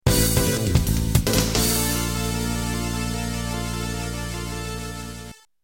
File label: music game over